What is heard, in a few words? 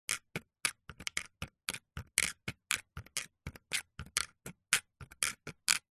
bird claws footsteps on concrete